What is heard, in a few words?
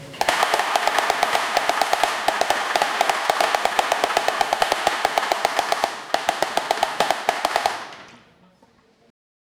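clapping sound in a theatre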